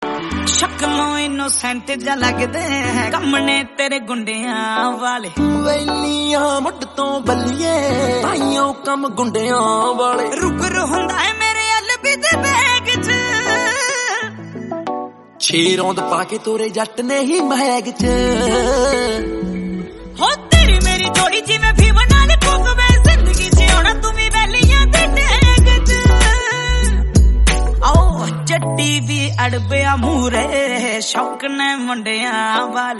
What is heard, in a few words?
Punjabi song